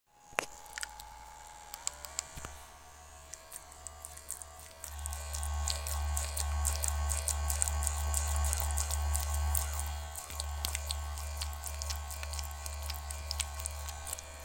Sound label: Quick wet noises